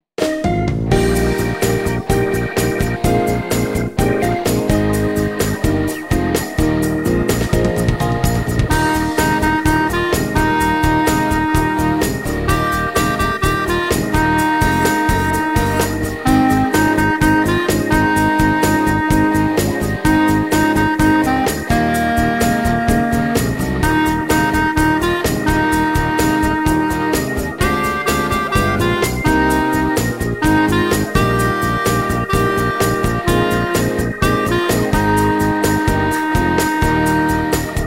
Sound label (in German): klarinettentaxi